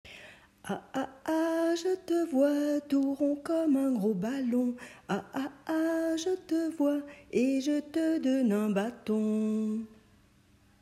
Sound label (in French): A chanté